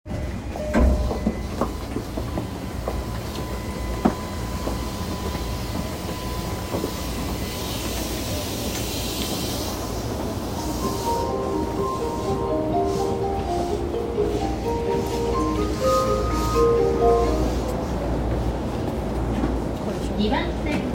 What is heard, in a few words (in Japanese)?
中央線快速豊田西, train sounds